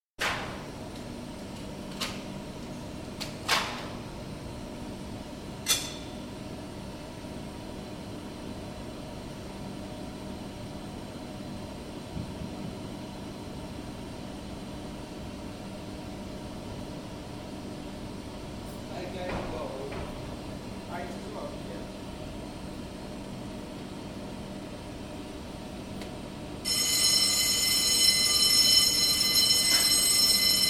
Goss Metroliner doublewidth web-offset press, plates being adjusted; 1980 onward; Miscellaneous Office; BBC